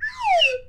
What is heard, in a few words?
Fast Drop, Slide Whistle